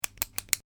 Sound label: Pen Click And Release Twice